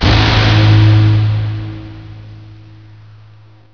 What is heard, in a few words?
Hit; hl2